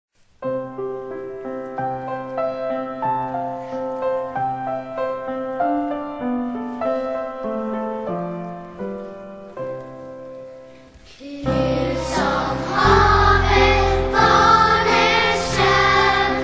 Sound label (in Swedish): Gud som haver melodi blinka lilla stjärna